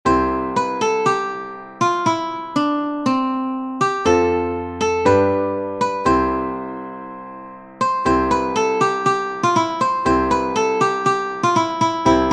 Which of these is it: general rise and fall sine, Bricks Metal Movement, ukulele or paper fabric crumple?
ukulele